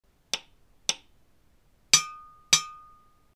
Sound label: plastic, horn